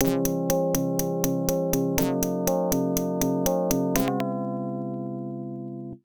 Restful (Game Over); Sky Shark; Toaplan 1; arcade

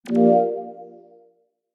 drums reversed clap

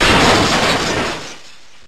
Icicle Crash; pokemon reborn e19 2022

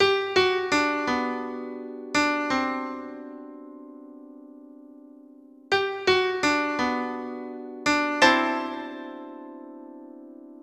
Ending; Tank Beat; DS gamerip 2006

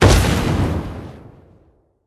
Demon Power; Level16

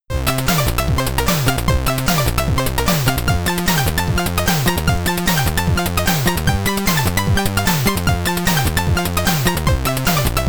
Stage Clear (SID Stereo); qix commodore 64

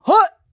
flyer attack

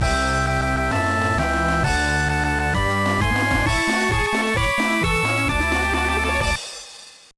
Jingle; Minna no Soft Series Minna no Shougi 2004 GBA

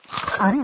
Frog Fed; candy crush saga gamerip

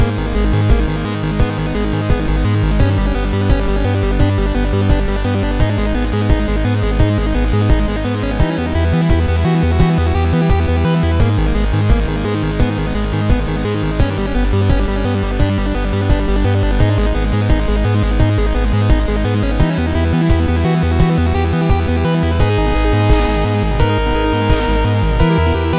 Boss Loop

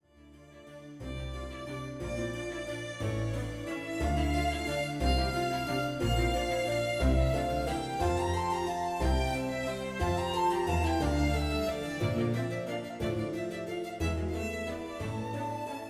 ballroom; Shadowhand; macos windows gamerip 2017

